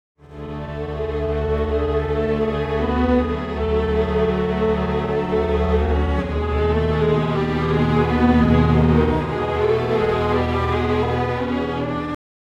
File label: strings, timestretch